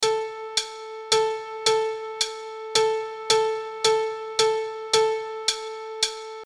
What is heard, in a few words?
Click Track